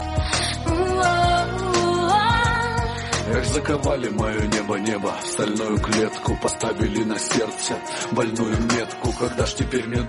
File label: russian song